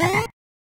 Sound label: Frog Jump